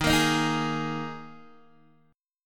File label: D sharp Suspended 4th D sharp, Guitar DADGAD; chord shape